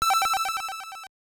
Weapon Activated; Gradius; MSX SCC

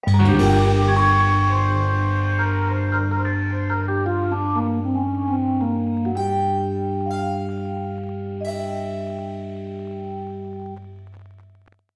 Gameover; Colt; deathloop

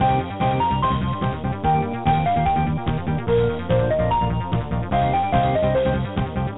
Power Up BGM; The Age of Heroes Silkroad 2; arcade

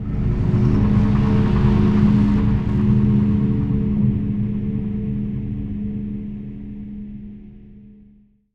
Stinger; Overdose

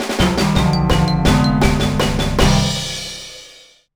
Lose; Phil Taylor's Power Play Darts

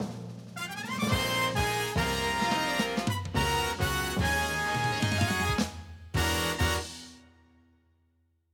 Survival Bowling - Round Start (Jingle)

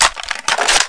reload A R Clip In

